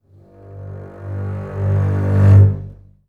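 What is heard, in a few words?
basses crescendo G1; Best Service; Peter Siedlaczek's Advanced Orchestra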